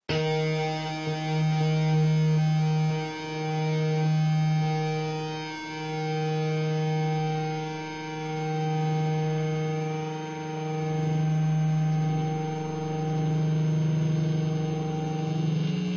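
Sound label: heavy harmonic tune up 6th, Electric Guitar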